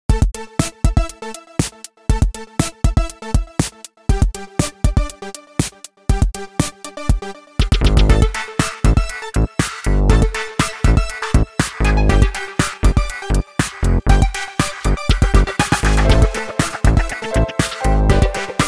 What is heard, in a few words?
Electronic; Techno